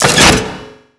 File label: electrical switch up; Spearhead Demo